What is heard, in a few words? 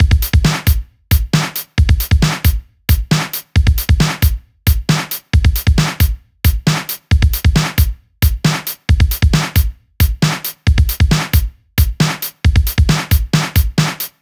Break Beats, 140 BPM